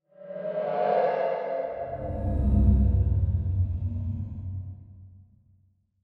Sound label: Feedback Metallic Sweep Rear To Front; 5.1 STEREO MIX; Blastwave